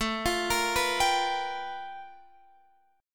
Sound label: G 7th Sharp 9th A chord; G7#9